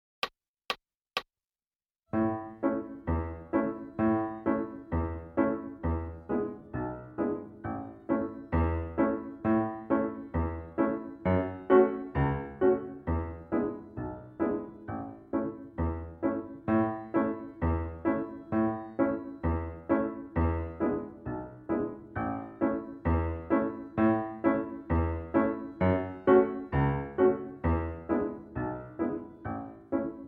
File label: Piano; Buffalo Gals